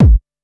kick dance, techno trance drums

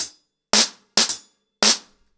110BPM, ACOUSTIC DRUMS; The Roots Drumloops

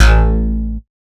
BASSES

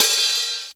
HH, HIHAT HIP, Dance Mega Drums; Best Service

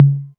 808 Tom Mid, Drum Machines

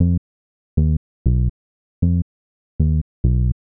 Smooth Bass (120BPM), retro house

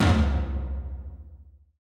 TOM TOM, Roland

